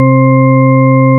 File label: CHURCHY ORG; Zero-G; Funky Elements